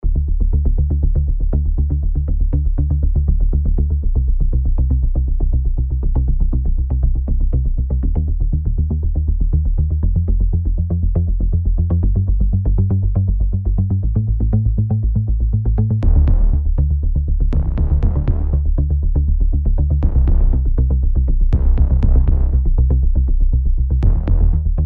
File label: bass